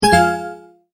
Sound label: multimedia tone error; Tones; Sound Design; McKinney Sound